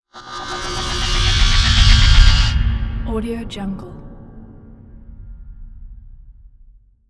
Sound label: bloody nightmare zenith stingers; soundmorph